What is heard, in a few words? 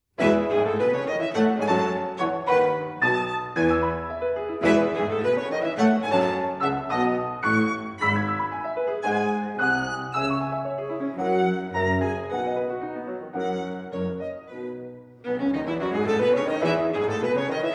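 piano trio; jadassohn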